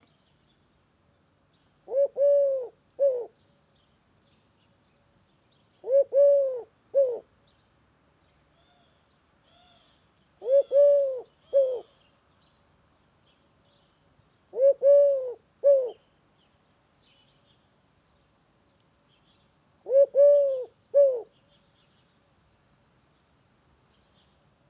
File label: Eurasian collared dove